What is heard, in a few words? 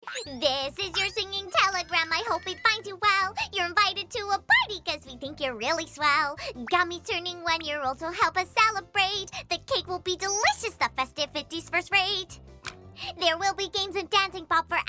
Singing Telegram (Acapella)